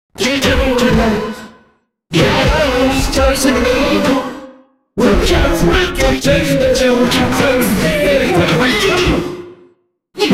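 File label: dramatic drums plus climax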